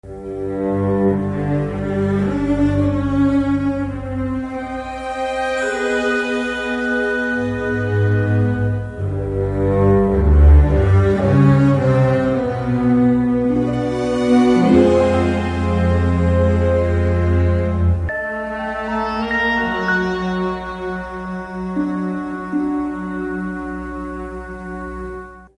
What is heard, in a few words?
music loop